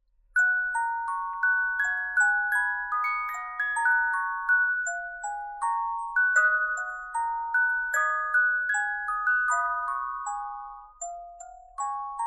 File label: musicbox